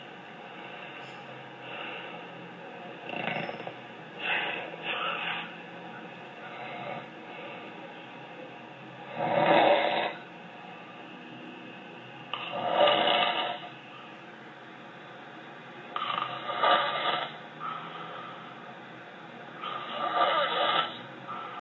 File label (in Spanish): la roncadora tipo leona